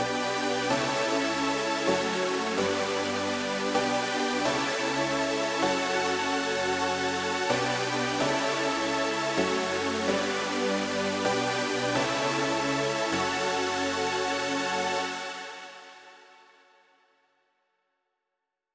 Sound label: 128BPM Pad Root G, TechHouse; Full Effect; Vengeance Samples; Bonus TechHouse Kits